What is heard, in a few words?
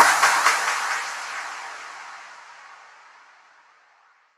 Snare FX; Ghosthack